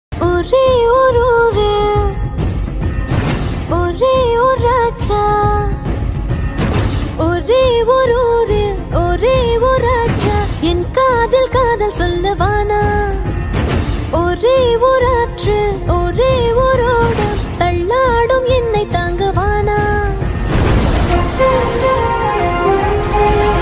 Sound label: tamil ringtone